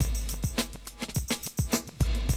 Classic DrumLoops

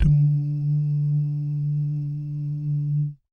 BS DUMM, Vocal Planet Jazz & FX; ILIO; BASS DUMMS

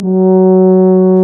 TUBA; Sound & Vision - Gigapack I CD 2 (Roland); Brass&Woods2

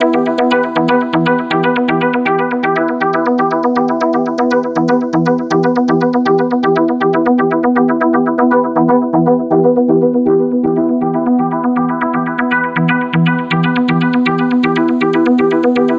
Progressive Synth, Trance

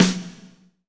SD Real, Roland; Sound & Vision - Gigapack I

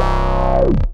Synth (low oww; Sonic Foundry (Sony Creative Software); Ugly Remnants VOL-2

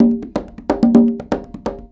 Percussion Loops, Bongo & Conga; Houseworx

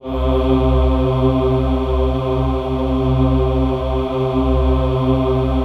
PAD, Dance Mega Synth

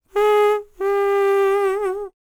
HUMAN TRPT, Jazz FX; Spectrasonics; Vocal Planet

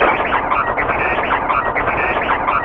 TAPE NOISE; ENVIRN-AMB

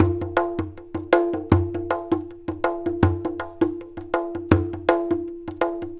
TABLAS, Indian Traditions; USB Soundscan vol.45; AKAI

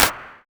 Tr808 handclap; Percussion

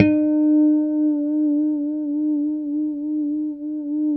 E4 pick harmonics, guitars; Spectrasonics; Hans Zimmer Guitars VOL-1; JC-HARMONICS

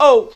vox OH

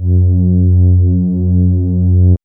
MOOG; AMG - Vince Clarke Lucky Bastard

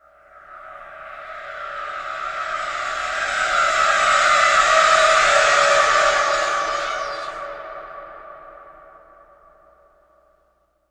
IMPACT SCARY, Cinematic Impact; M-Audio Pro Sessions; Liquid Cinema

